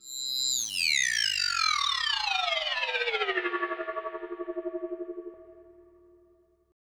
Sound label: synth FX, Hardcore Breakbeat, 134 Emin; Big Fish Audio; Hardcore Breakbeat Action; Mellow Jack